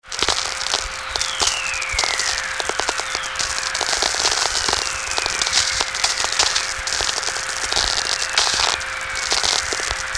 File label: 3 whistlers